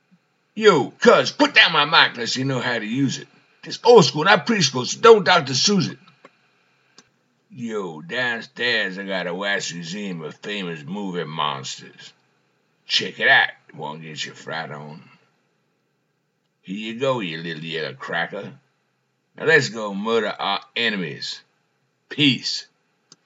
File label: urban rap